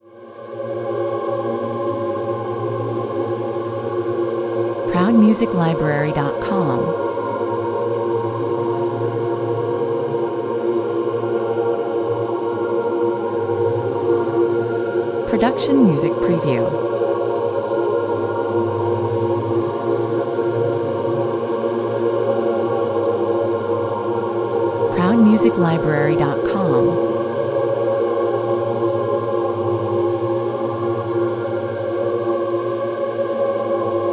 tormented chant 111 bpm

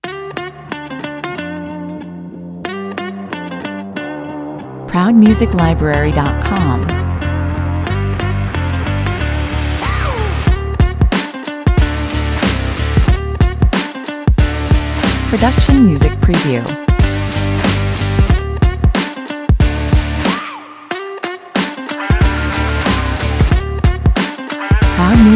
brave beats (no scratches)

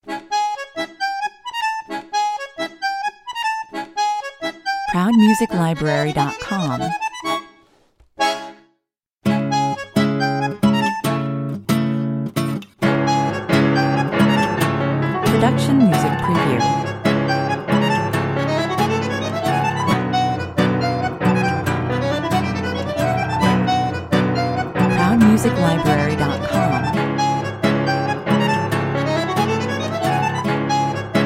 carrera argentina 120 bpm

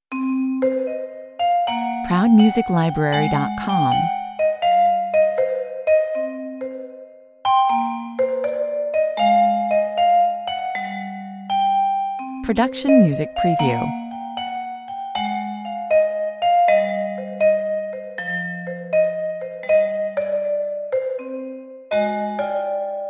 cool vibraphonation

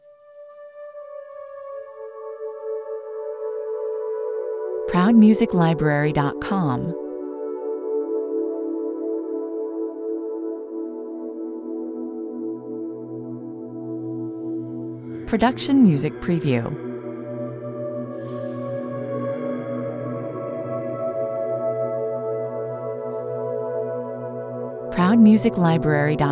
thunder voices

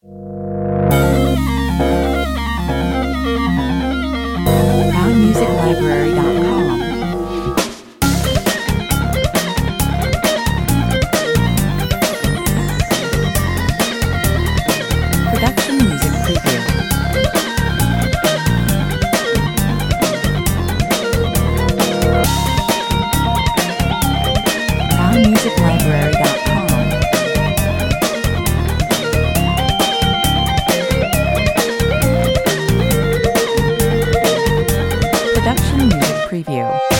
laser invader 135 bpm

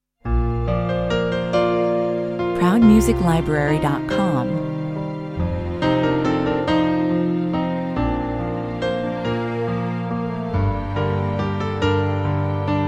cheerful 140 bpm

